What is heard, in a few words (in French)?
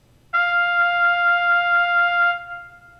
Fa trompette; chapitre 08 Signal sonore frequence niveau sonore